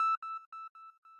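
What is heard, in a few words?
square lead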